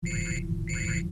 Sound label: ship beeps; alien sounds; andorean